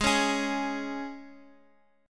C sharp 5th, G sharp, guitar chord

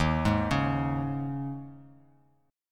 D sharp 7th Suspended 4th; D#7sus4 arpeggiated; JGuitar

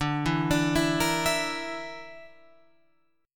D Suspended 2nd Sharp 5th D, Guitar, Open F; 14,11,14,11,13,11; arpeggiated

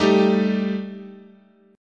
G Suspended 2nd Sharp 5th, guitar chord

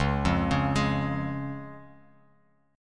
C sharp Major, Guitar Open F; arpeggiated; JGuitar

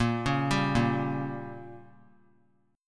Bb Minor 6th, guitar; arpeggiated; JGuitar

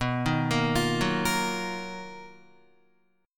B-Major Sharp 11th B down Guitar; BM#11; arpeggiated; JGuitar

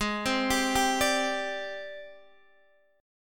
G sharp Major Sharp 11th, Guitar Open D

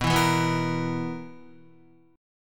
B Major 7th Suspended 4th, Guitar; BM7sus4; strummed; 6,7,4,4,4,6

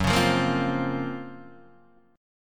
Gb Major Sharp 11th, Guitar Open D

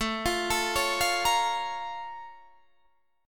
A sharp Minor Major 7th

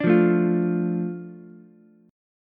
A Suspended 4th Sharp 5th, Ukulele; Asus4#5 strummed; JGuitar

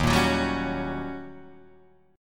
D sharp 7th Sharp 9th, Guitar, Open D

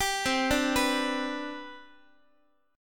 C Major 7th Suspended 2nd C down Guitar, chord; CM7sus2 arpeggiated; x,x,10,10,6,10; JGuitar

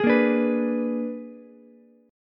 Bb 7th Flat 9th Bb, Ukulele C6; Bb7b9; strummed; 13,10,10,14; JGuitar

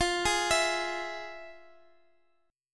F Minor Major 7th, chord; FmM7; arpeggiated

